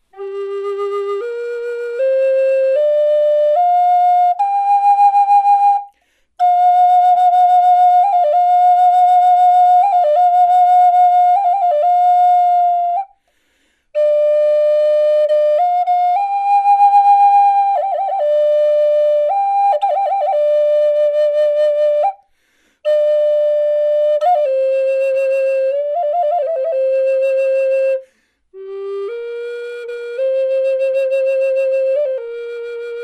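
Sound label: natural, flute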